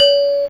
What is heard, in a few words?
Gender C5, Fairlight CMI IIe, Mallets